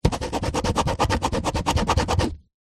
office pen ball point scribble on paper on wood table; zapsplat